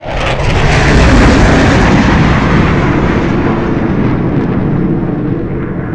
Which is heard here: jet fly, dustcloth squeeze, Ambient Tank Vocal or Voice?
jet fly